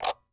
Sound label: Electronic Remote Control Button Sliding; AdobeCC